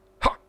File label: Hop